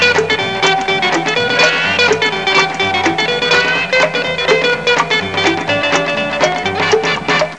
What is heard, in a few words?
dance; My Science Fair